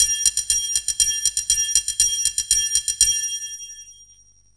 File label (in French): triangle; MOBICLIC